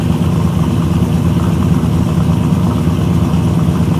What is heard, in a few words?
Chevy Silverado Idle; car simulator 2